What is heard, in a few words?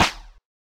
Snare Small Slap